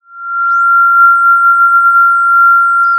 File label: Sound of a police car siren